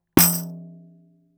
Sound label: Tamb MXL 992